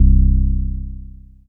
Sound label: BASS Warm - C; Synth One Shots